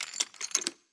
clear Bet, roulette; americanroulette3

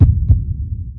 ambience Add

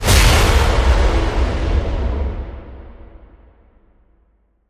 big Win Text Change; wolfcub

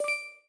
big win end; fruits

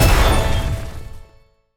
minislot Stop; colossal pinatas

